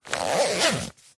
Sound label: luggage rucksack backpack zipper; zapsplat